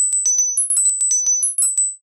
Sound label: twinkles; There; ThereClient; obstacle